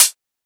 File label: Closed Hat; FLPRO